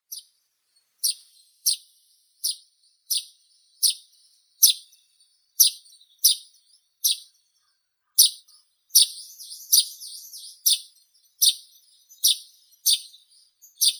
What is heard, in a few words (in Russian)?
vorobei rizhii passer rutilans, zvuki prirodi